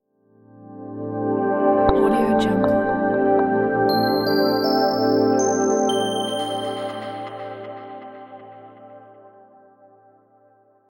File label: open logo